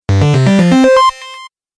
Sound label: Game Positive Win Award, video game